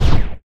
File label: combat air laser fast; geoscape